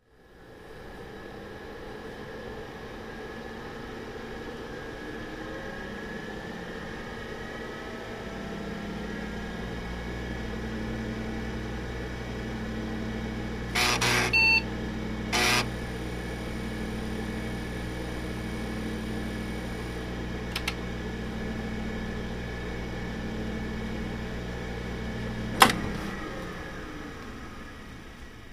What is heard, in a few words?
Turning on, turning off the old computer